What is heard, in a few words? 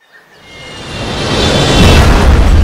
Airplane; asdfmovie